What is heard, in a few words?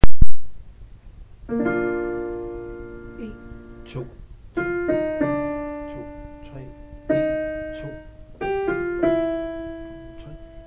we wish you 2. alt